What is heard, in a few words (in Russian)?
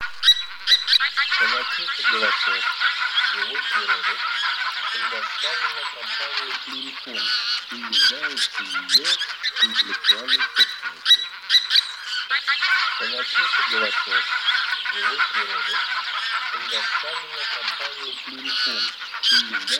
Гуменник Oche Zampe Rosa; plurifon